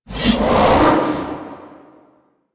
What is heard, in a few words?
Spear Gun Throw Charge; Weapons; Warframe; Tenno; Hoplite